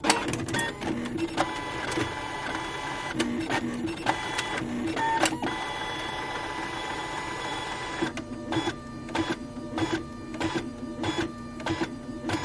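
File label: Machine Printer Click; printers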